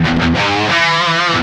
Pickup Guitar Riff; SFX Kit; Pickups

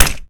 Grenade Bounce; SFX Kit; Weapons

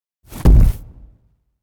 Footstep From Monster Dinosaur; Hollywood Edge; sound designer's toolkit v2

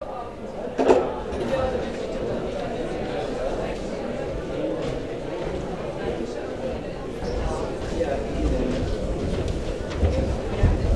crowd enter room, crowds interior; best service Blue Box

